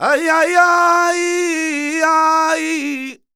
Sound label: voc c, Flamenco Vocals Male Cantaor; Male Cantaor 2; Best Service ProSamples vol.46 - Flamenco